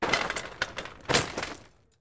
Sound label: Bicycle collision; anemoi